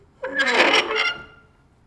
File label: Creaky Door bolt